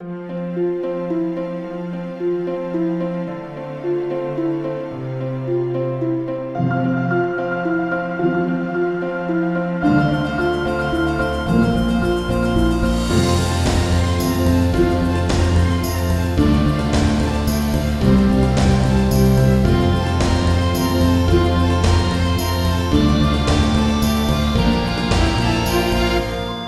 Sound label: Uplifting Commercial